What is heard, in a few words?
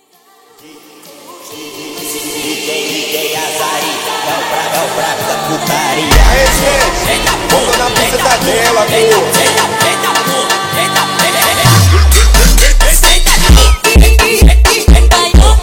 FUNK